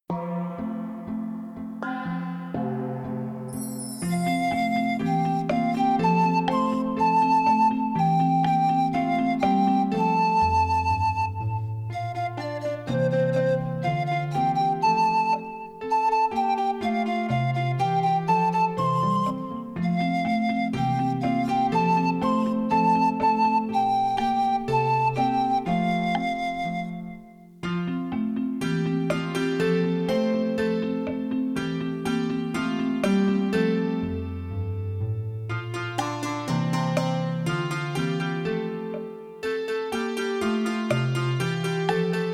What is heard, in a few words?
Instrumental; Oshogatsu The New Year